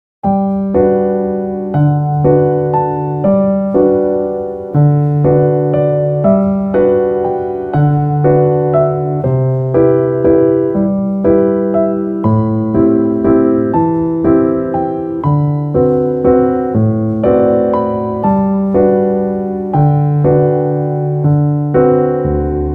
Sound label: bgm